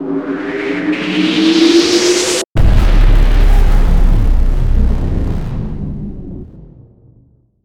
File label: Big Energy Explosion